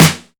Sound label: clicky acoustic snare sample E key